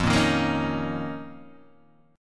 F 7th Suspended 4th Sharp 5th F, Guitar, DADGAD

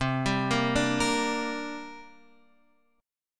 C 7th Suspended 2nd, Guitar, Half Step Down; C7sus2

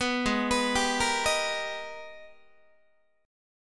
Ab Minor Major 9th Ab, Guitar; arpeggiated; 4A,4E,5A,5D,5G#,6C#; JGuitar

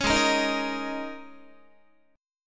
Ab Suspended 2nd Suspended 4th Ab down Guitar; strummed; JGuitar

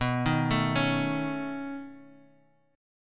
Db 7th Suspended 2nd Sharp 5th B

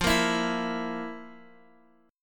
F Major 7th Suspended 4th, Guitar, Open D

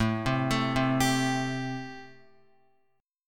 A-Minor 7th A Guitar; x,0,0,2,0,3; Am7 arpeggiated; JGuitar

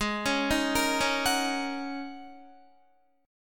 Ab 9th Suspended 4th Ab down Guitar; Ab9sus4 arpeggiated; JGuitar

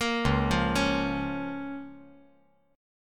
Db 6th; Db6 arpeggiated; JGuitar

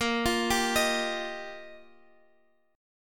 G Minor 7th Sharp 5th, Bb

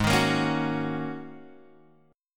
Ab 9th Suspended 4th Ab down Guitar Open G

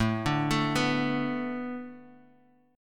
A Suspended 4th Add 9th A

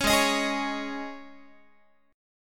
Ab Suspended 4th, Guitar; strummed; Absus4; 16,16,18,18,17,x

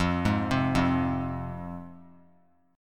F Minor F down; Fm arpeggiated; JGuitar

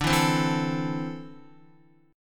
D 7th Suspended 2nd, Guitar; strummed; chordshape

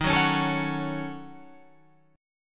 D sharp Minor 7th Flat 5th, Guitar, Open F; strummed

